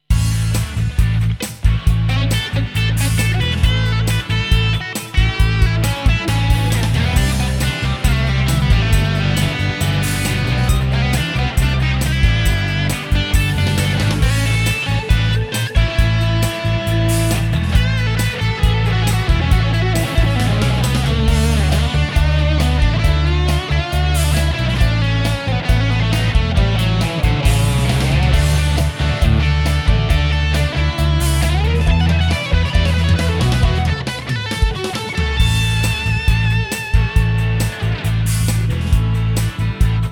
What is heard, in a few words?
Classic Rock Stack Solo